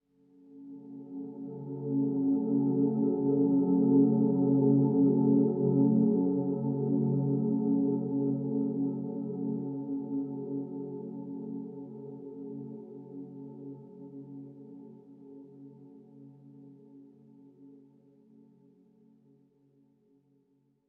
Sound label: Swell Tone; HDD Theme Scull Swell Tone 04a; inside